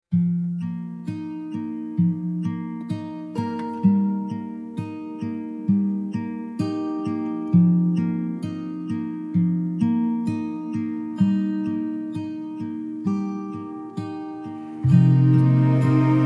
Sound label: ambient folk journey